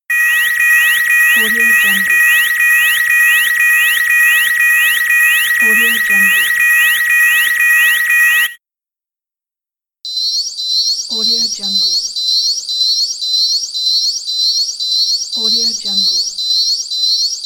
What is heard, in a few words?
annoying auto alarm; efxideas